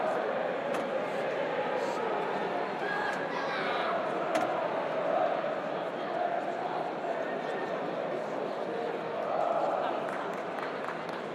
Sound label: distant crowd chanting; swansea city fc